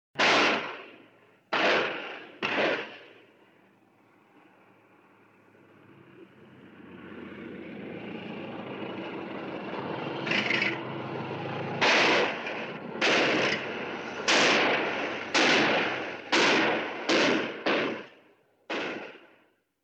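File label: Shoots with silencer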